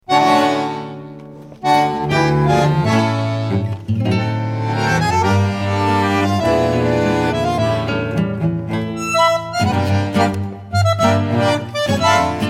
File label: Tango Trio